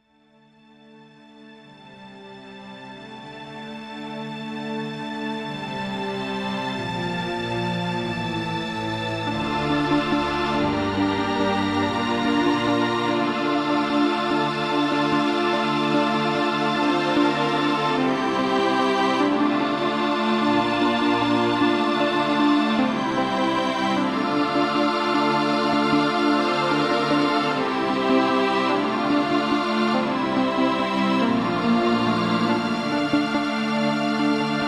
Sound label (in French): CORTEGE EN SI